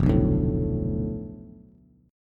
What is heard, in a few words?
F 6th Flat 5th F down Bass, chord; F6b5 strummed